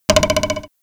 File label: springboard; lego ninjago rise of the snakes